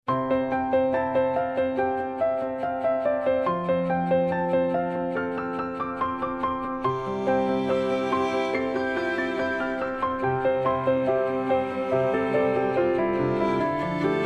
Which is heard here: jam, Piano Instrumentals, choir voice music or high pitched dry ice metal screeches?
Piano Instrumentals